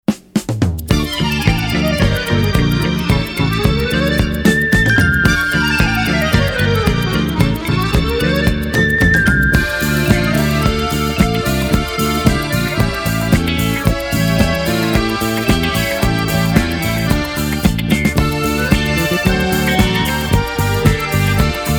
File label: Russian Disco